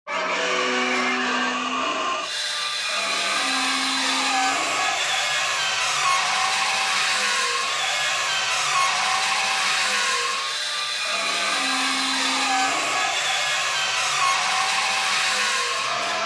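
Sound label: screech of metal moving on metal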